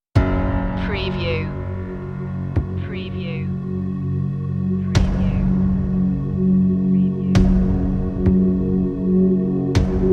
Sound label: drum and bass, Suspenseful and investigative, dark synth; suspense tension